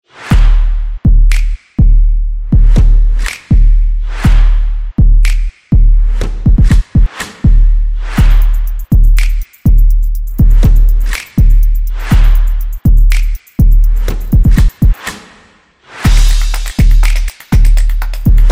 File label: Beat Background